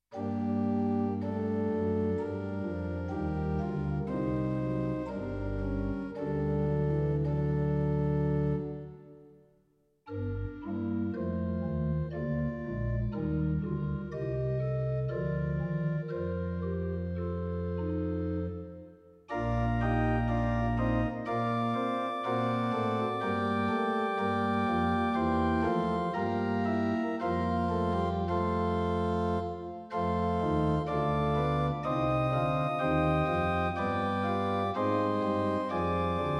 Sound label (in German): Choralthema; Expander